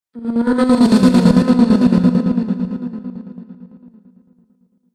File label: Female Space Orgasm, sci fi music